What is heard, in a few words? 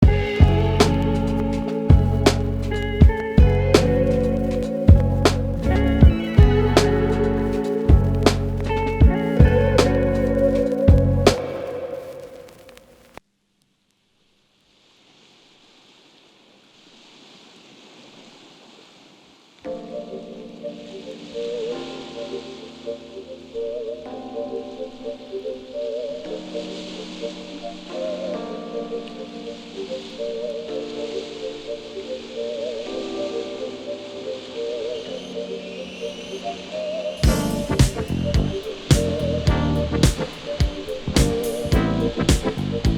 Brit Funk Records